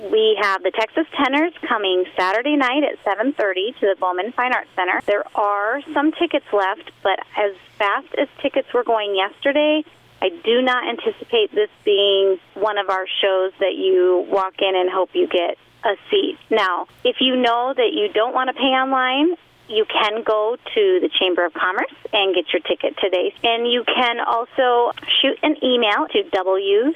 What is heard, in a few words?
Tenors